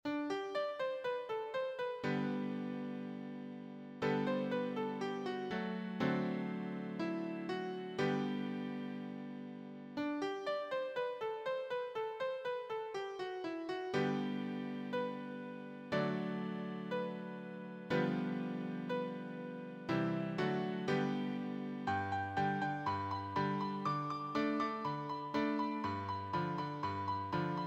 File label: accompaniment, piano